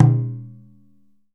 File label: DJUN DJUN, African Drum; E-MU Producer Series Vol. 7 – Old World Instruments